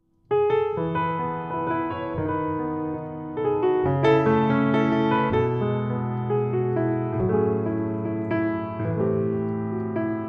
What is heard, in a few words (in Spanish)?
piano; versión piano